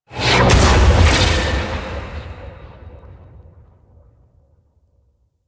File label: Psychic Bolts Cast; Warframe; Nyx; Nyx Updated Sounds